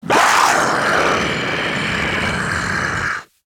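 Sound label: alert, zombie male; nmr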